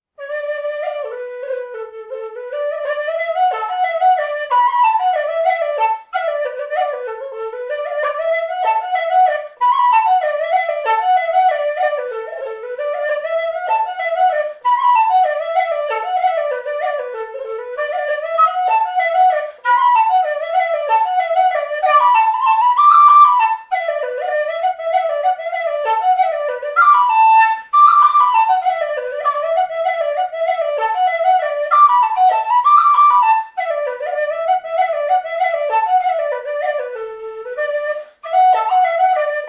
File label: Slow Barn Dance